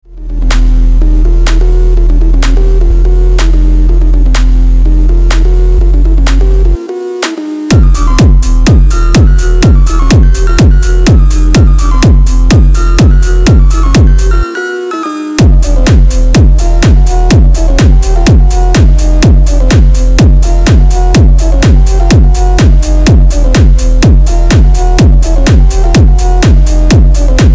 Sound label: fonk bez slov